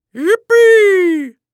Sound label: Human Male Yell Yippee Old Man